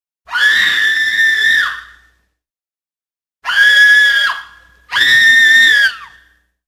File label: Woman Screams; Halloween Havoc - Sound Effects; Ktel